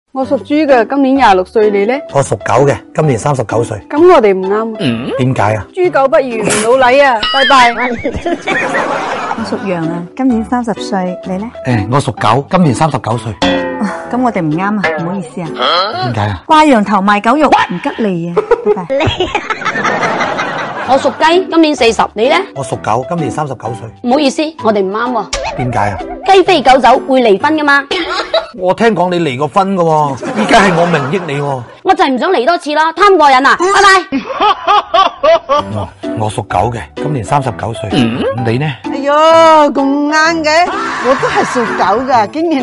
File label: laugh; breadradio